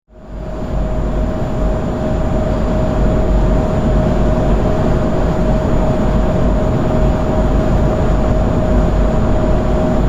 Building air conditioning system drone.; Air Conditioners; Household

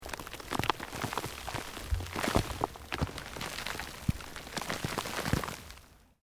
EARTH LOOSE MOVE LONG, Debris

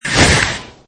Skiing Snowboarding Jump Hard Snow Slide Grind; mono; Skiing And Snowboarding; Lynne Music

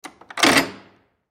Jail Door Unlock, Jail Door Unlock Door Close; Prison Door; Emergency; Prison Doors (Sound Effects:Doors)

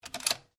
RIFLE REMMINGTON700 7.62MM BOLT ACTION CLOSE; A selection of domesttic firearms; Rifles; Guns

